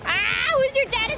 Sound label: Scream aarrgh; IRyScream; who's your daddy